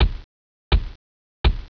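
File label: wood staff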